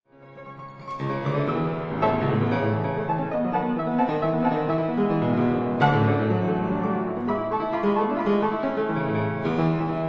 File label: Etudes for piano F sharp minor